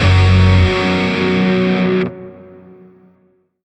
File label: Shawzin Hirajoshi Chord J; Music; Warframe; Grineer; Chords